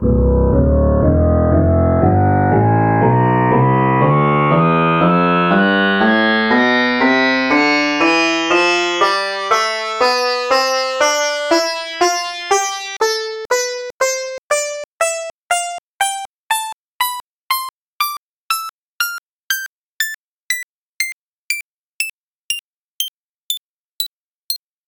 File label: sitar tonleiter